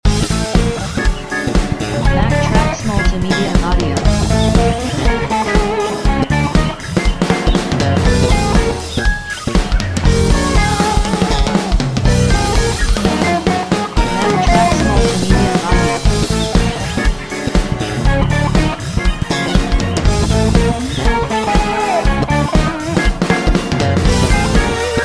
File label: groove